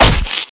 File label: pwrench hit bod, weapons; svencoop; legends